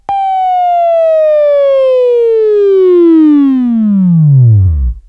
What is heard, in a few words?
Buzz; Labview-Program; Sweeping Training program